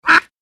Duck quack single call; bird

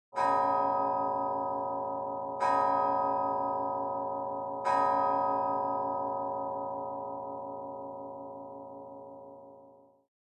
Grandfather clock striking twelve

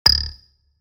HUD futuristic button click

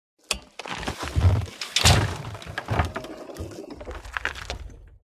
Scooter lifting off the ground

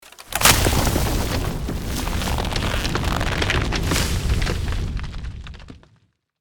Magical forest growth vegetation nature

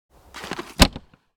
Motorcycle storage compartment open; vehicle

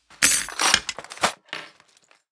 Kitchen drawer cutlery rattle

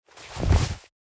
Jeans pants handling

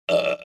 Female burp strong and throaty

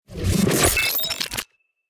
Digital glitch intro logo; logo animation

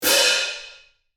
Sabian cymbal crash short

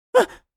Scared anime boy vocalization

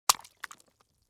Aquatic frog jump and water splash

